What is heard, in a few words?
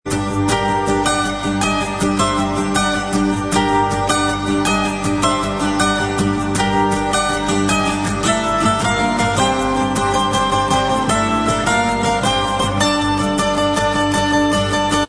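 acoustic version instrumental track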